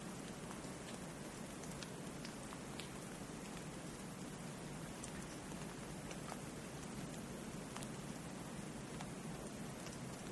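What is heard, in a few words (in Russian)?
потепление тающий снег в лесу; loop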